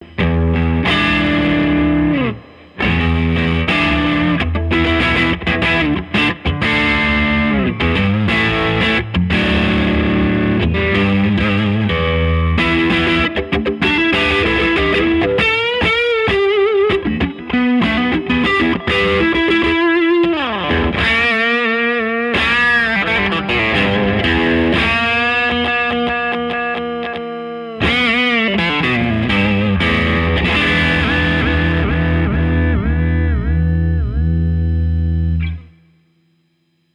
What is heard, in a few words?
Jensen BB40 CRUNCH STRAT; jensentone